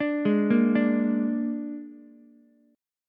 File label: G sharp Suspended 2nd Flat 5th G sharp down Ukulele, chord; G#sus2b5; arpeggiated; JGuitar